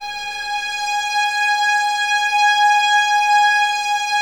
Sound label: violins G#5, string ensembles; Miroslav Vitous; 11 Violins